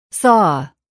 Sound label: saw